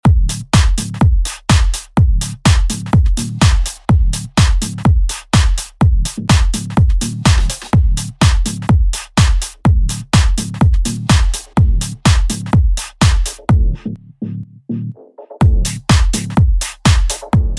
Bass House Club Mix; Mainstream VIP; Vegas Edit; 125 Bpm

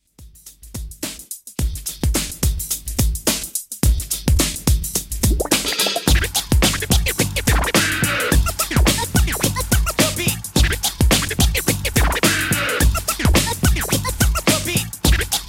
Scratch Redrum 107 Bpm Dirty

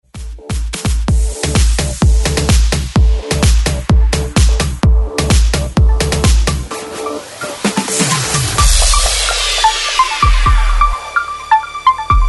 128 BREAKMIX; DJDOLLS

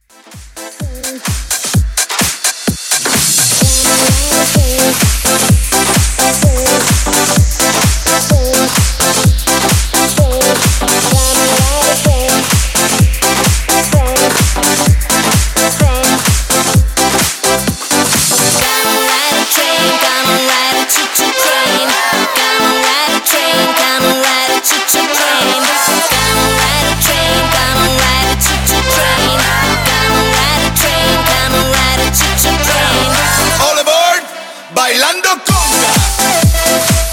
Conga Dance ReDrum, 128 Bpm

